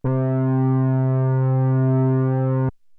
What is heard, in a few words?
ANALOG, BASS; Best Service; Hyper Dance